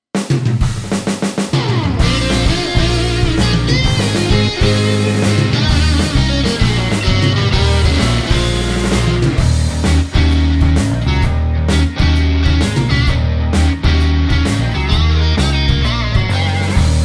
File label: Blues Bluegrass